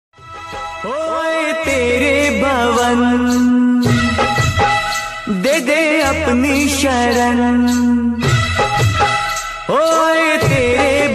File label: Navaratri Bhajan Song Ringtone